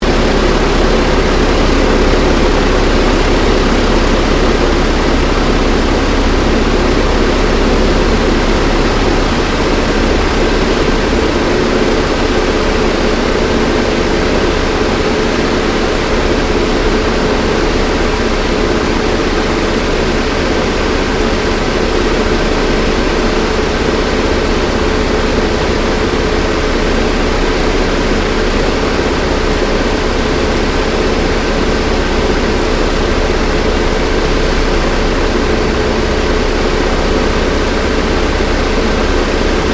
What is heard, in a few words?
AIRCRAFT T-28D TROJAN FLYING INTERIOR; Airplane; Vehicles